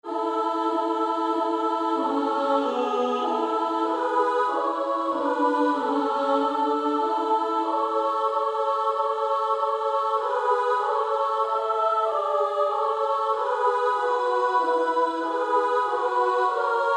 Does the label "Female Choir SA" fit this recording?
Yes